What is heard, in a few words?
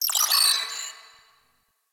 Tech Data Beep; Large Ramp Hall